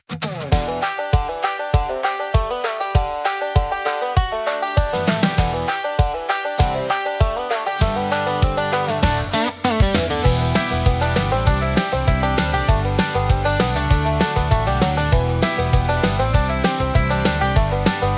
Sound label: Sun On The Highway, country acoustic